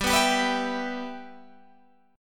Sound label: G 6th